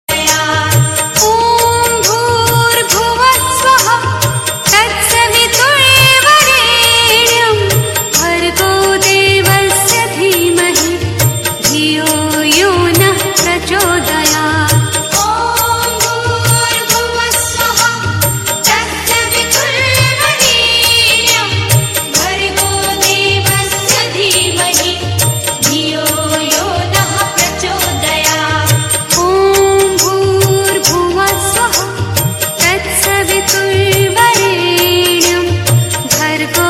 Gaytri Mantra; Gujarati Mix; Gujarati Ringtones

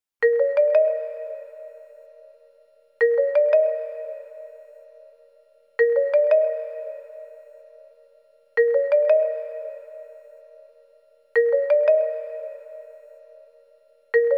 Discreet, Alarm Tunes; Ringtones

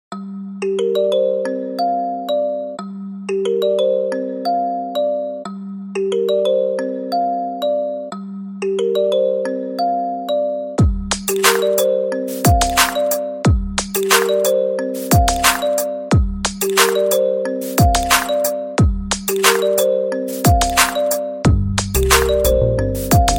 latest iphone 12, Iphone Ringtones

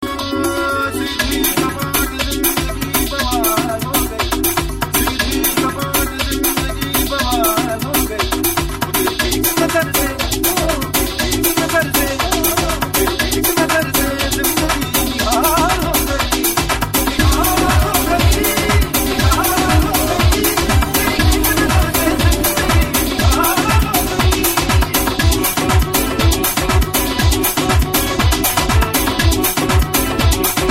Ultimate Trance Mix; Hindi-Remix-Tones; Hindi Movie Ringtone

